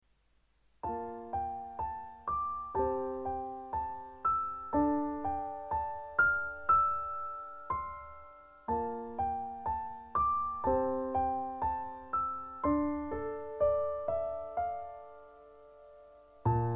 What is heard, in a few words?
piano